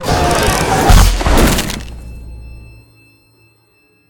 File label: Jumpscare; boxy boo v2; BoxyBoo v2 SLVM; Boxy Boxshe